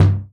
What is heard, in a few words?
Percussion, Analog Drum Kit; Decca; Cymatics - ANALOG EVOLUTION Beta